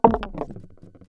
fighting game source synthesized sweetener ui button press